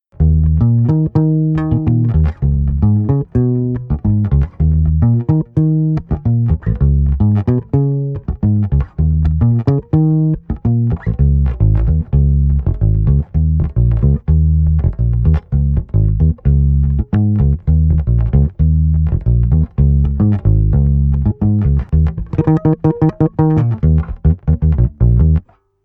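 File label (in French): precision bass 63 étouffé; Manche de JazzBass et micro Hepcat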